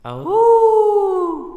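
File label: wolf howling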